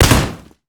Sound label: slam door